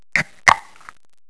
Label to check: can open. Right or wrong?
Right